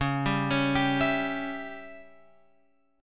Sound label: C sharp Diminished C sharp